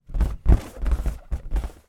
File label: foley balloon inflated handling